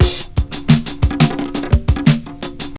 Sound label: fat on funk, drum loops